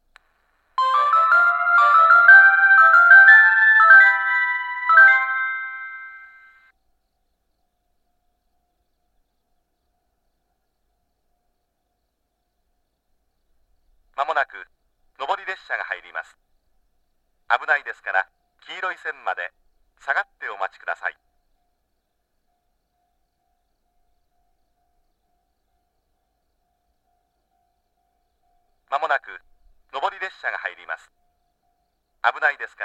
yariminai nobori sekkinn

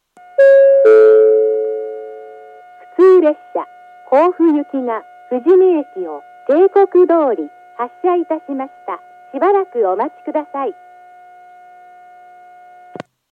shinano sakai 2 bannsenn fujimi hassha

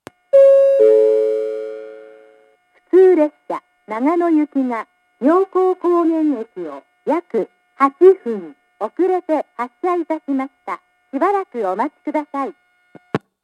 kurohime 1 bannsenn myoko kogen hassha

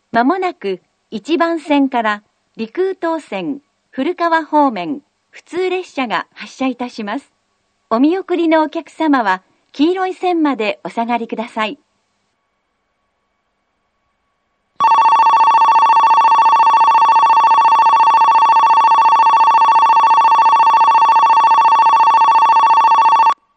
kogota 3 bannsenn

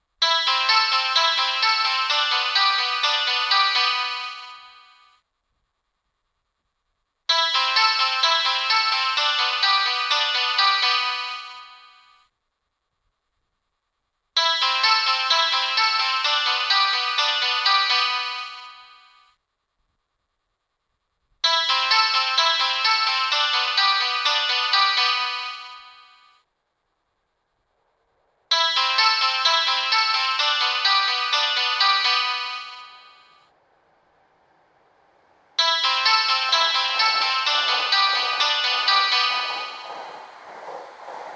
hokuhoku oshima pass

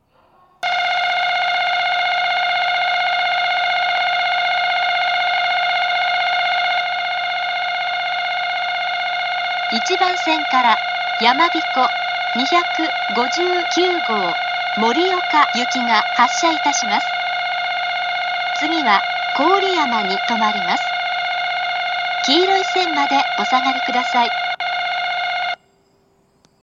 utsunomiya 1 bannsenn

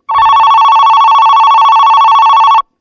hyuga 1 bannsenn

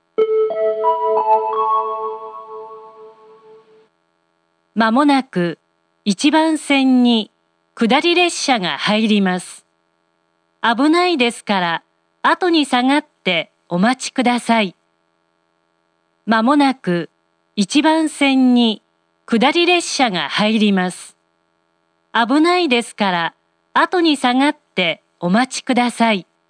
yumoto 1 bannsenn sekkinn

